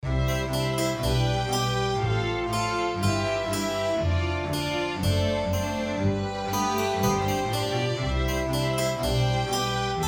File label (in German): Cembalo, Modern Classical; ArtGuide; Light, classical tune. 18th-century style; BPM: 120